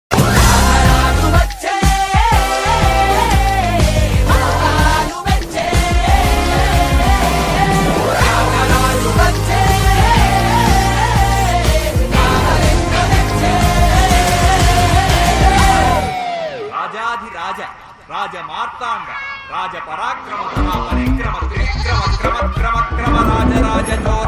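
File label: title bgm ringtone; raja raja chora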